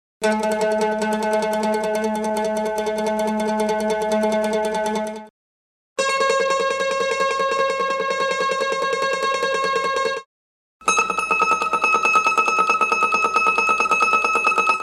Mandolin, mellotron

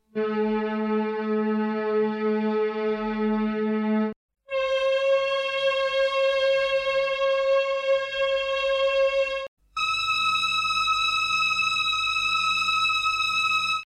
16 violin, mellotron

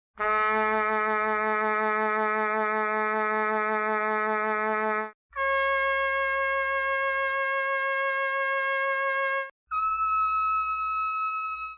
c trump, chamberlin trumpet

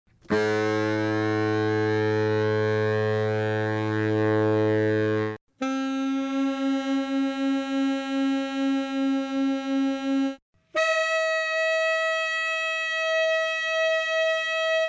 saxes, Mellotron

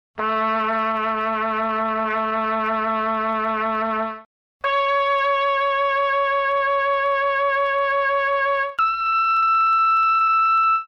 trumpet, mellotron

